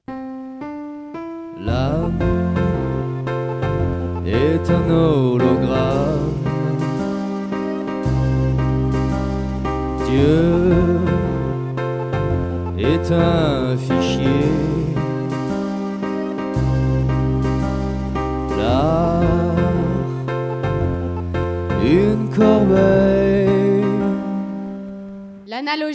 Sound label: piano